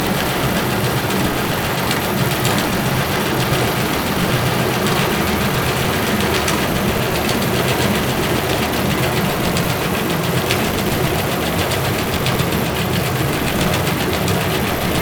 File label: Hydrophones, orcasound